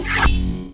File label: Launch Companion Drone; SWARM